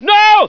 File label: scream, scientist